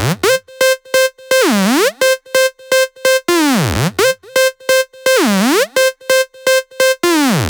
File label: 128BPM Melody; Vengeance Samples; VFH1 128BPM Southern Kit